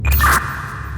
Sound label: radio turn on; We Were Here Forever